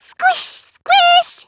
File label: squish squish